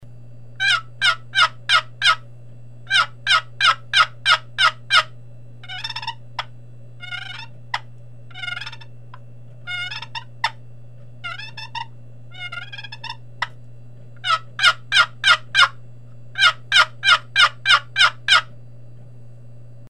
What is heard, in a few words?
Poplar Dual Hens, box call